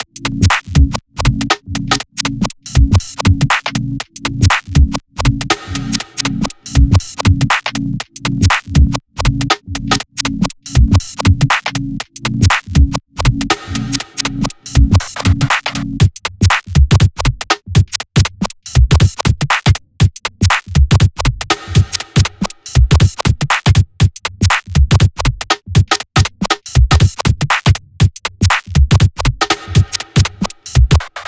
Achievement; Radirgy 2

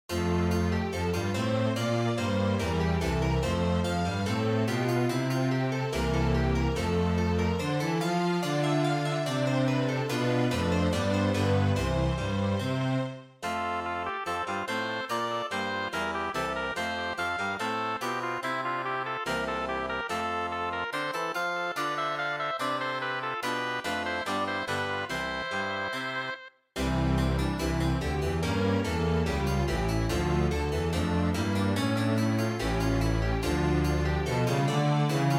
Midi Slow; Secret of Magia

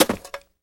Shovel Dirt; Prison Architect

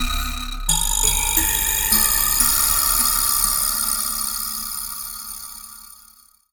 Lo Vibraslap

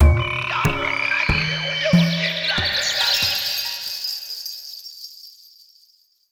basic Mood Bosque

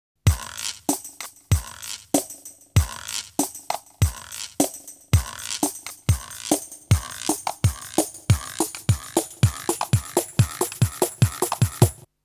Tension Drums; mario party 3 original soundtrack 2001

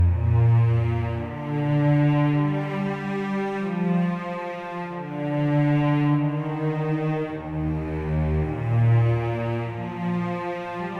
Cello; Toki Tori 2

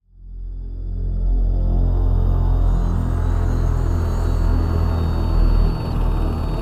136bpm intro; Doom; rune

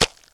Splat Attach; Blastmorph Pinball; xbox series xs

